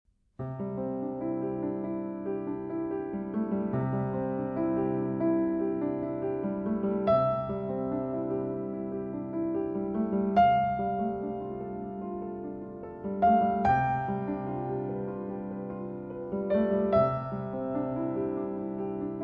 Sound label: Ave Maria Variation